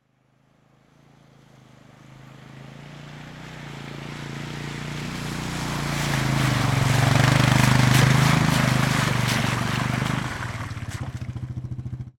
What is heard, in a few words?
transportation atv with trailer approach stop very muddy wet; sound effects one